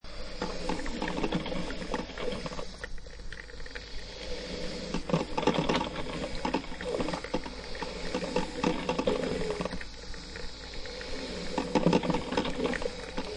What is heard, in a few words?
Coffee Maker Coffee Brewer, Domestic coffee maker; Coffee maker, Coffee brewer 01: Domestic coffee maker like a Mocca Master or similar, brewing coffee.